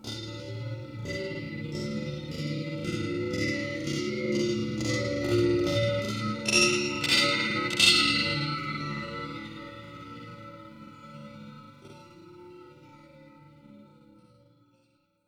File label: water; ウォーターホーン05; 不穏でミステリアスな音 Waterphone